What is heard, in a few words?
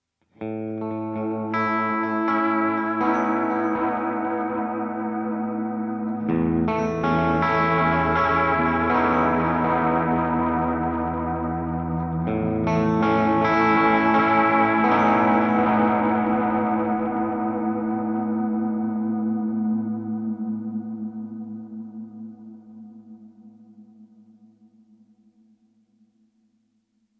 clean; ToneMasterPro